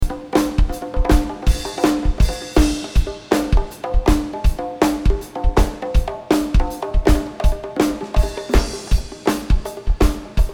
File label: Drums